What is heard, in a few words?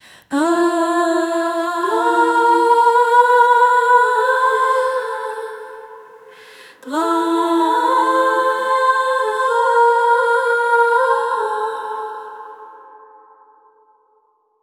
acapella of woman opera v